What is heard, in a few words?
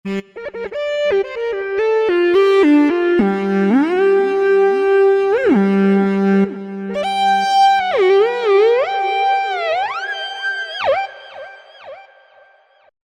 Bowed Lead, Roland V-Synth; Factory Patches